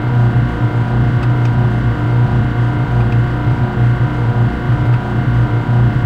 large generator loop

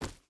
footstep hide forest run; Rust

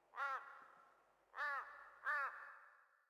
sting crow; Rust

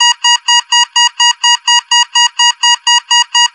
alarm altitude short

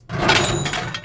objects, World of Tanks

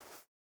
footstep burlap sand jump start; Rust

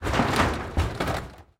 truck rattle

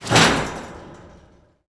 catwalk jump

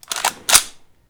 Inf Weapons Foley; Red Orchestra Ostfront 41-45

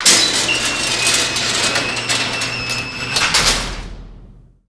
airport sec gate close; Call of Duty Modern Warfare

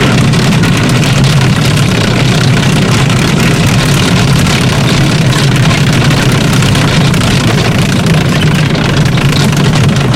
tracks speed, tanks; War Thunder

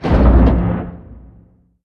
torpedo; World Of Warships; weapons

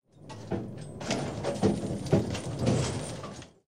rocket reload; tanks; War Thunder

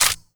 no ammo

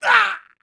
hurt heavy

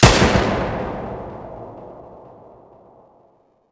mg 1shot stereo cave

